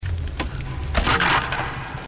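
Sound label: breaking crate; rise of the tomb raider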